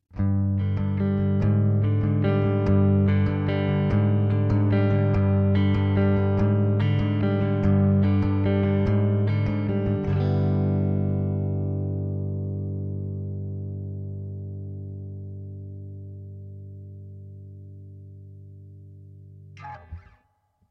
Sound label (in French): clair arpege; arpège son clair